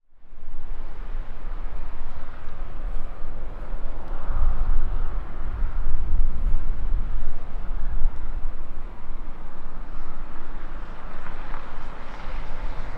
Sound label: Car Come And Go